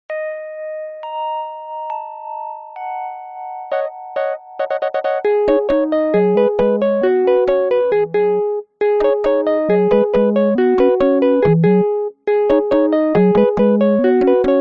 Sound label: Elevator Music; Ben 10 Alien Force Vilgax Attacks; PS2; PSP; Wii; Xbox 360